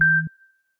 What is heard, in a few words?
Beep Once; Samsung Galaxy S24